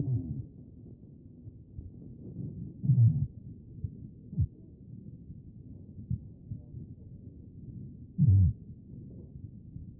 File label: chest; B MODE